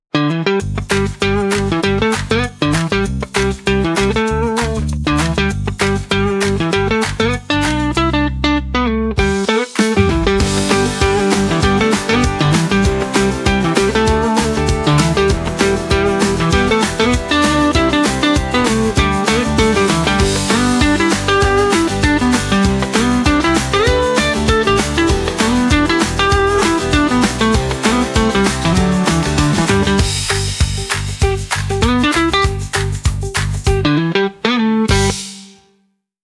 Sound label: 98 bpm Handclaps Guitar; 36 sec; ai social media music